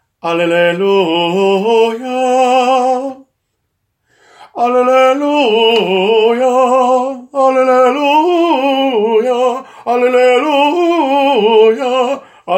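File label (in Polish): Alleluja TENOR, choir tenor part; Mawby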